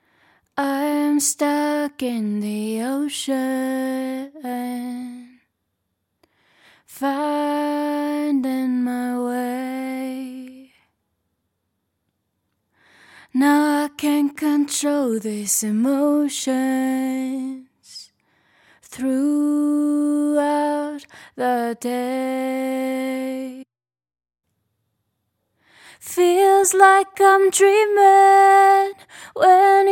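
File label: RnB pop 75 A minor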